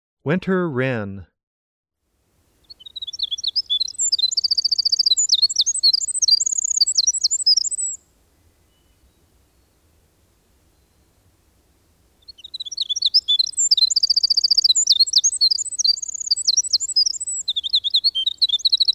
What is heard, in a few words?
winter wren